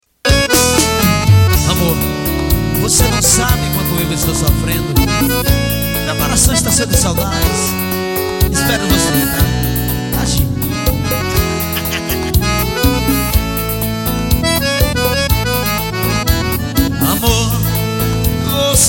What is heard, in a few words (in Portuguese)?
seresta